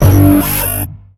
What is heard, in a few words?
Pickups Powerups